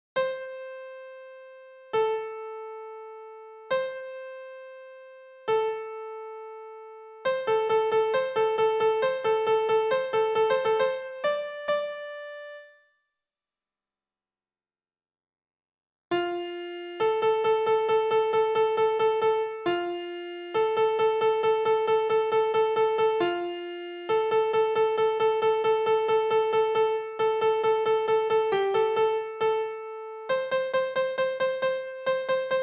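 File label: baritone solo; Vashon Chorale MIDI rehearsal samples; In taberna quando sumus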